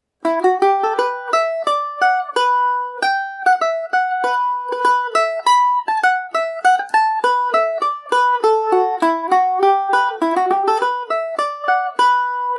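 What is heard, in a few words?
E dorian, Irish mandolin; mimi and the new generation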